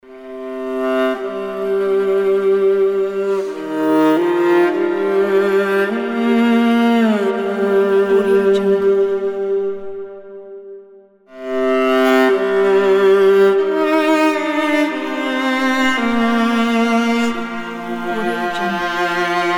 sad strings and viola

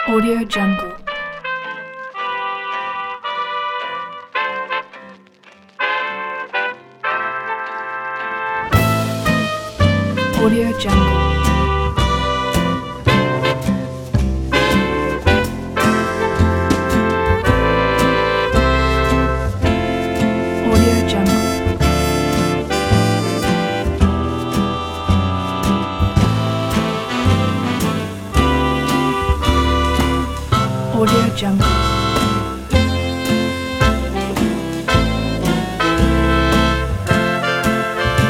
vintage jazz big band swing

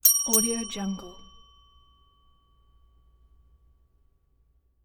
desk bell ring; soundjay

